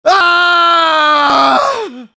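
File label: pain critical death; engineer; svencoop addon; tfc; player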